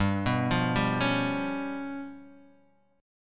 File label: G 7th Sharp 11th, G, guitar chord; G7#11 arpeggiated